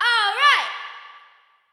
pick me selected; pork knox

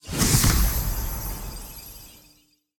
pc Pick Cash High; hellskitchen

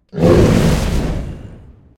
mystery Sym Reveal

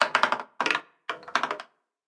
ball bounce, roulette

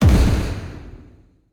multiplier low land; starburstxxxtremeusa

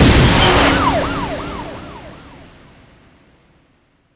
big Win Text Change; hotline

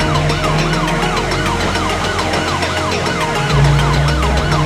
intro Free Spin Loop; hotline

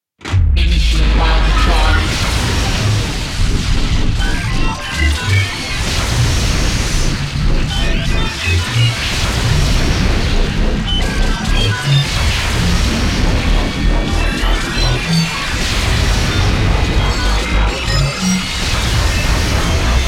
tension Start; wildotron 3000

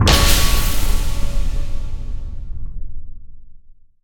lock It Land; narcos

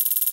count Up

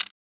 marker Place, roulette; advanced-roulette

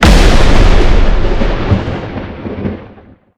mega Win; asgardianstones

